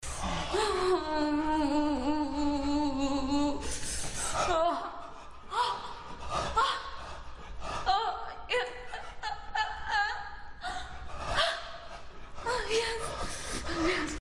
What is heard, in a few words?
sex, moans; The Witcher Hunt